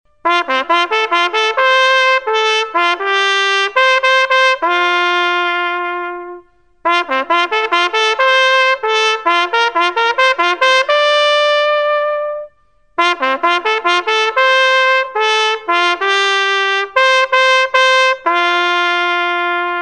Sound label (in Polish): hejnal